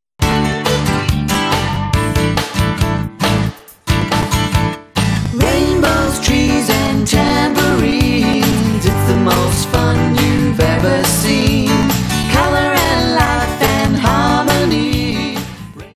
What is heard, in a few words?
Tambourines; Rainbows Trees